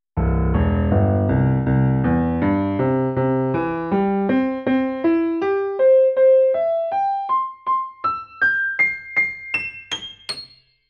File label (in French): arpege